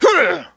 gut scream; Rust

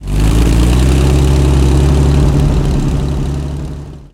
comet engine load, tanks; engines; War Thunder

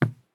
run wood; Escape from Tarkov

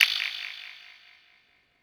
lock radar end

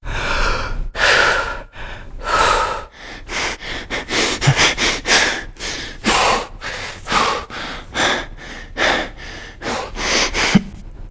player cliff breaths dangle; Call of Duty Modern Warfare

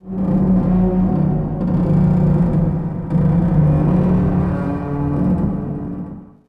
harbor occasional

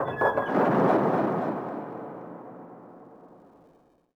city occasional

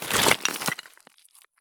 stop tile; Escape from Tarkov

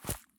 sprint grass low; Escape from Tarkov

